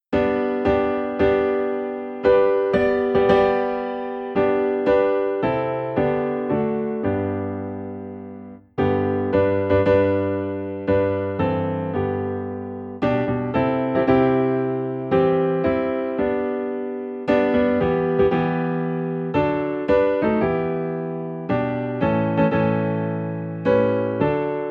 Piano in G

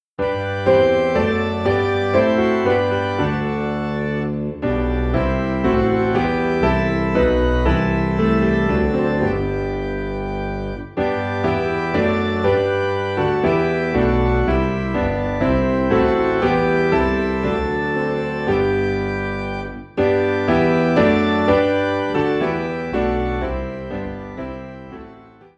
Piano and Organ - High in G; Praise To the Lord The Almighty

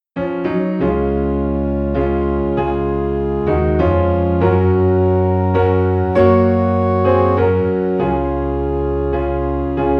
Piano and Organ in D Flat; Just As I Am

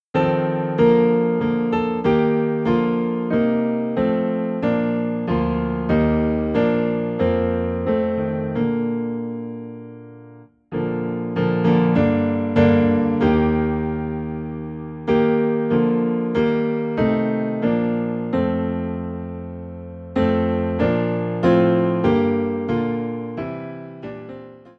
Piano - Low in B Flat; Crown Him With Many Crowns

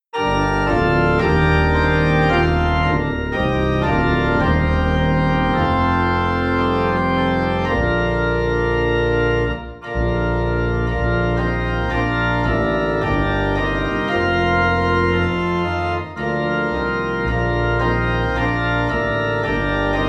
Organ Low in B Flat

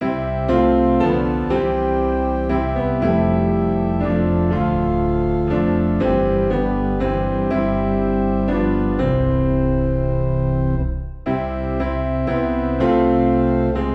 Piano and Organ Low in C; Breathe On Me Breath of God

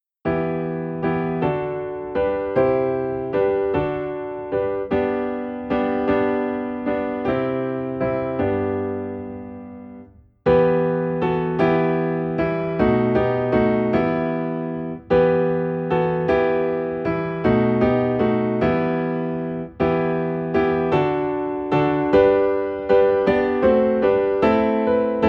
Piano in E Minor; christmas; We Three Kings

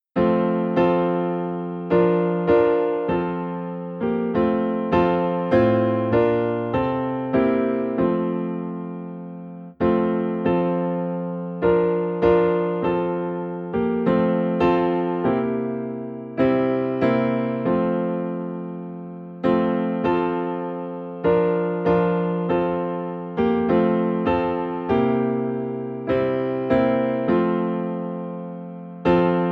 Piano in F

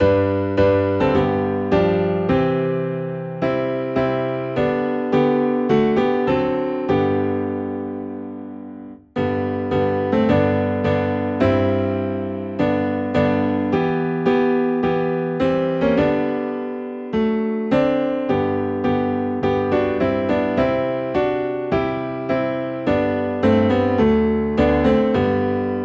Piano Low in G; Tell me the Old Old Story

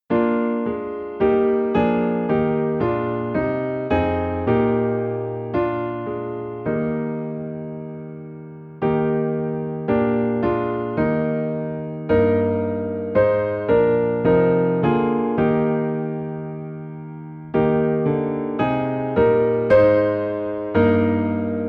Piano in E Flat; Abide With Me

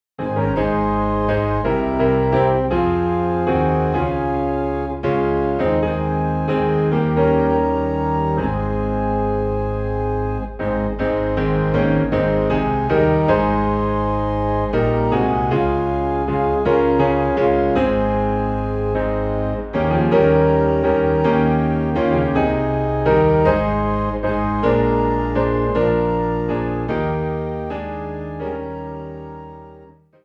Piano and Organ in A Flat

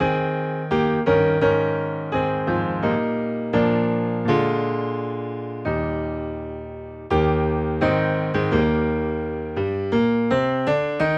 Piano Low in E Flat

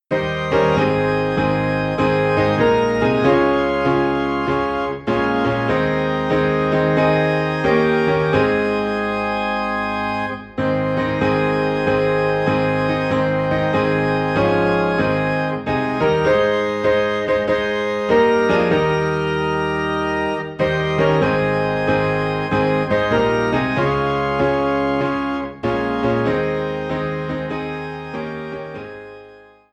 Piano and Organ in A Flat; Are You Washed In the Blood

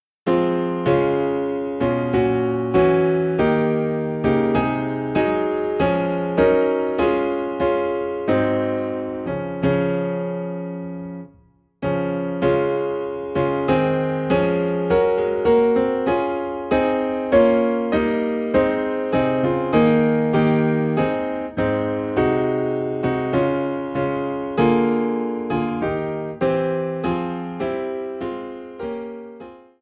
Piano in D Flat; Almighty Father Strong to Save

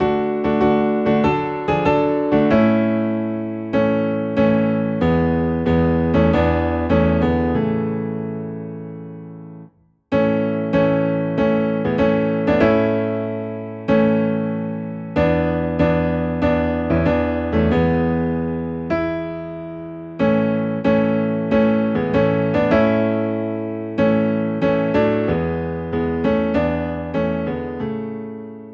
Piano Low in A, hymns

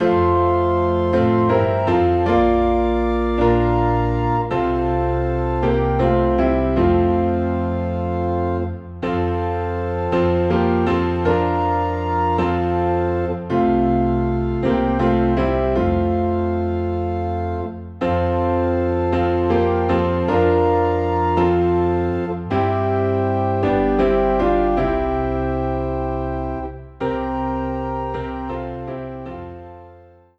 Piano and Organ in F; Take Time to be Holy

